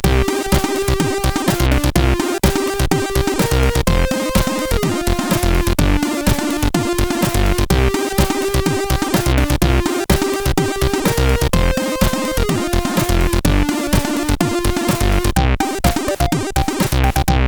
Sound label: House, sid CSG8580R5